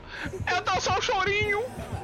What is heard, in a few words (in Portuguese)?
meme chorinho